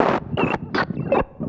SFX LP, 160 BPM, Drum & Bass; Ueberschall; Drum & Bass Flash Trackz

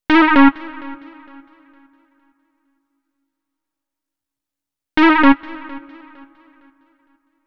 Melody, Hip-Hop

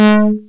808 Conga modulated; Drums; One Shots; Percussion; Groove Elements

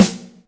Steel, Yamaha DTXpress Acoustic Snare

